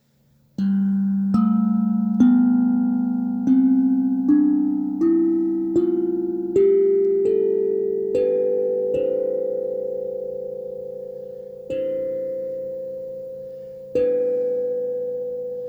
modrý stupnice, kovový buben meditační modrý

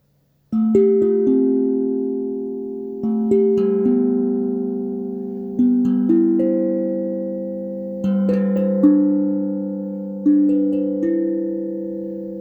kovovy buben meditacni modry

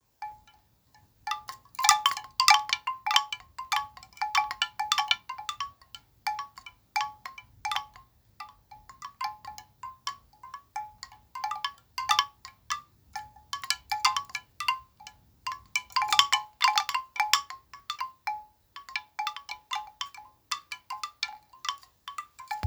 buddha, bambusova zvonkohra

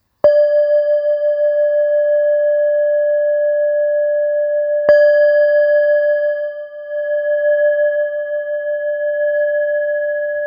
chopa, tibetska misa choepa 211 g

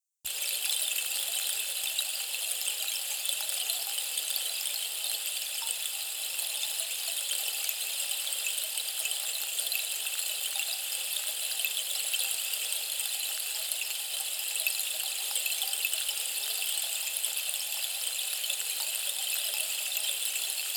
harmonizujici destny sloup 50 cm topol; 8 minut

